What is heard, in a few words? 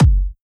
Kicks, Elektron MachineDrum; SPS1 MKII